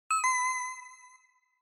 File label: Inspiration Mid; Onoma